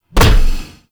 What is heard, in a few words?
Bash Hit; Warframe; Warframes; Hoplite